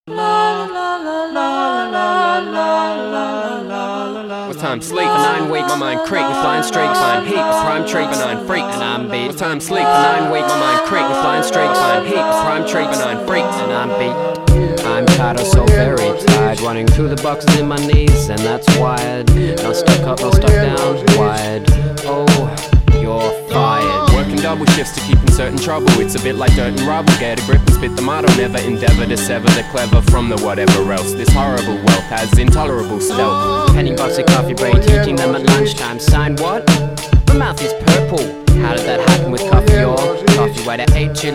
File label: Remix